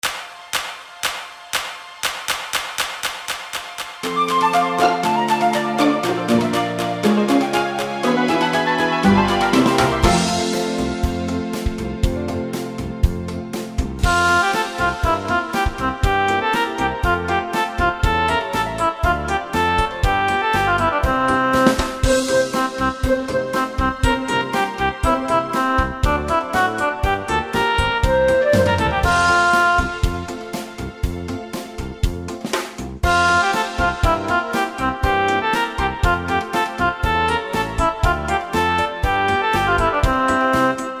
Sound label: karaoke